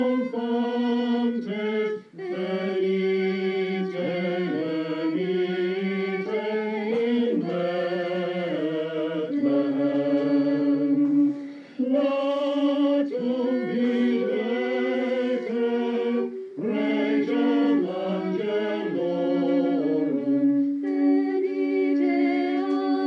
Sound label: cathedral choir; clare fm